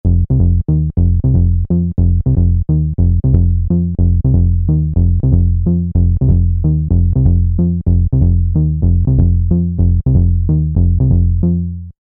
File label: Roland JX03 Bass